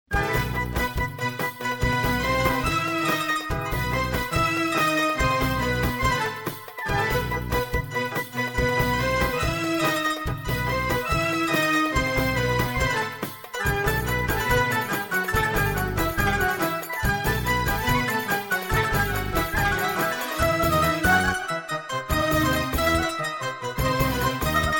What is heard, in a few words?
Instrumental